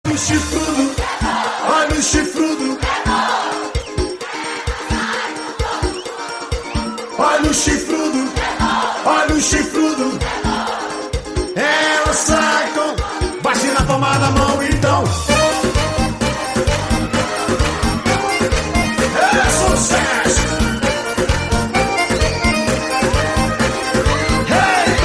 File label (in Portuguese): forro